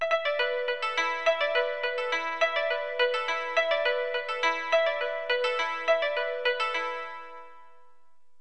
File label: koto